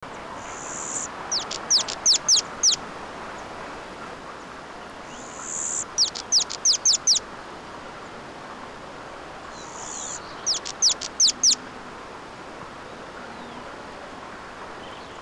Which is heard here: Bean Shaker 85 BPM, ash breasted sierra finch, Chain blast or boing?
ash breasted sierra finch